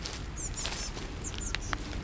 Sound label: urban steps through leaves